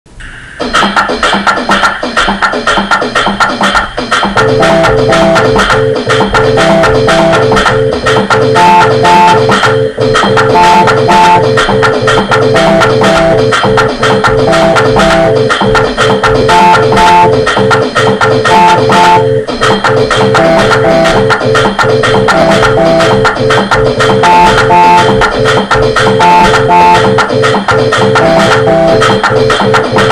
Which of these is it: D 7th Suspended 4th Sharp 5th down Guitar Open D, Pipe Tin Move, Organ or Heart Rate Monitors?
Organ